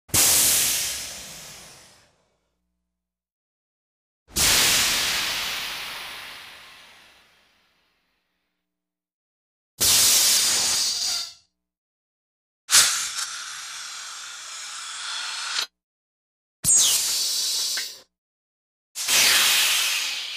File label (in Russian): zvuk para